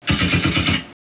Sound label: mecha gun far; ambience